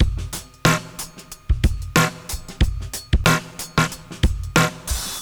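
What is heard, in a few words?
92 bpm, beat loops; Zero-G; Monster Beats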